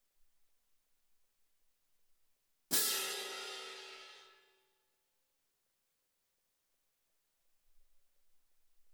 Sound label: Cymb Close